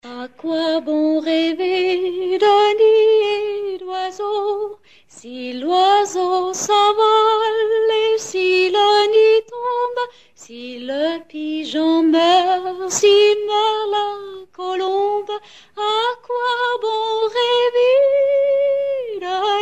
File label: song